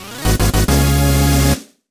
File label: Medal Acquisition Jingle; Medal of Honor